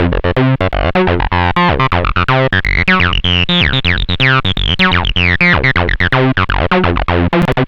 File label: TB 125, TB-303 LOOPS